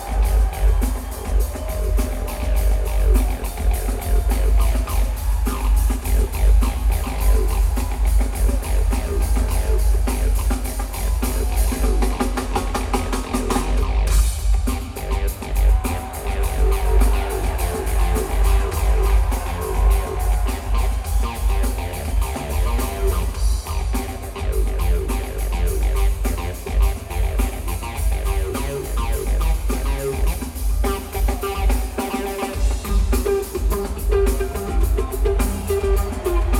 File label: Bass and Drums; Burgettstown, PA - Post Gazette Pavillion